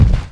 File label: Claw Mutant Body Thud; Playable Tutorial Level; TR3